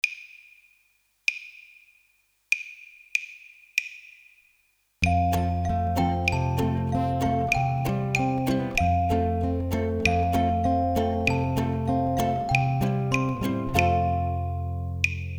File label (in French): mésanges vertes instrum